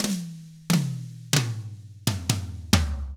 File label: SLOW ROCK, Drums; 66SLOWROCK